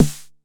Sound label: Snare 909; gate experiments